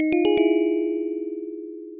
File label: grave jingle; gbjam12; jingles